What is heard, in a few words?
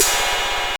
Fx; Computer Music Aug 01; E-Lab; e-LAB Demo Samples; Goldmine